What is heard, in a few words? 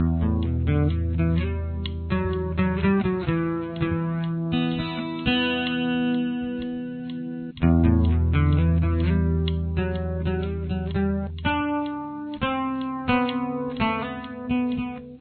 fill solo